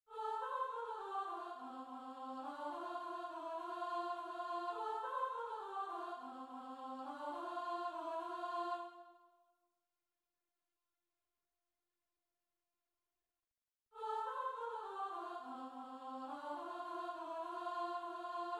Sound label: Soprano; All is Merry; xmas